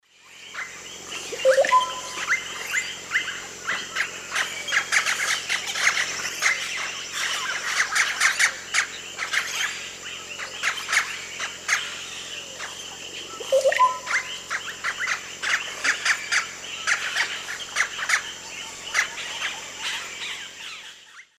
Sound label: Psarocolius angustifrons, calls and song at colony; S. America; Eastern Lowlands